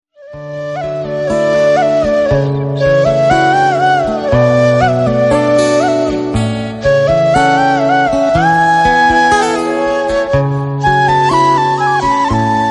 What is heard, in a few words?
flute bgm